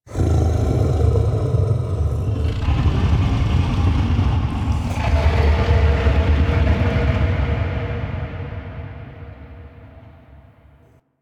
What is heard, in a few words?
Brain Grunt One Off; Warframe; Infested Eris; Inf Flesh Scape